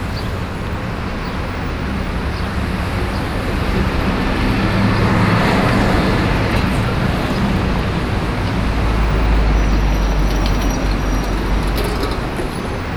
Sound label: street traffic, ambience